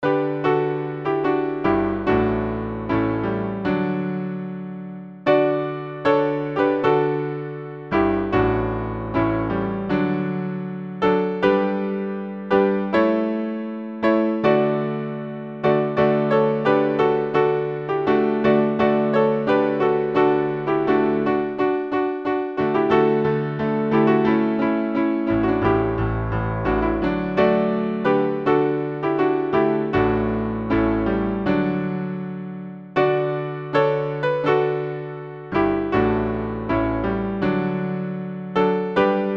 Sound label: Hymn, piano; Joy to the World; Antioch